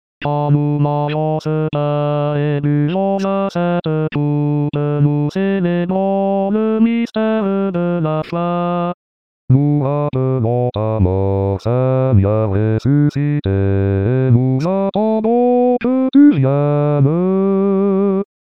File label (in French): basse, chorale